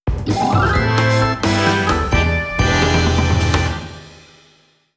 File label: Royal Warp; Kirby Triple Deluxe; Dedede Mode Warp